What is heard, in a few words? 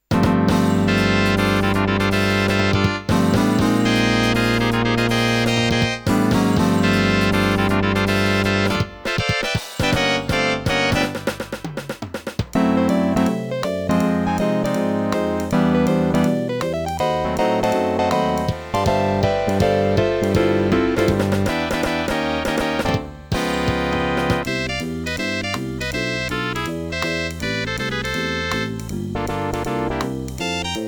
pss-780